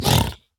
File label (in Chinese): 猪灵蛮兵受伤声